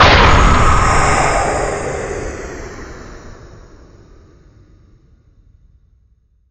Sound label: psy hit, ambient